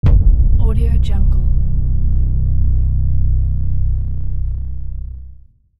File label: sub noise impact